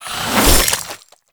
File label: Infested Boomerang Whoosh; Weapons